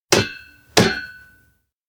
bike tap; anemoi

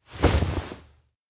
father pull shoulder; rikka; anemoi

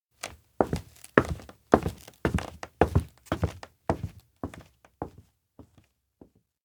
steps indoor abandoned cafe leave; anemoi

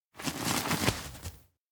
hold small hand; rikka; anemoi パッケージ版 1.1

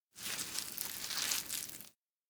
dragonfly chew; anemoi

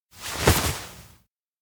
collar grab; anemoi

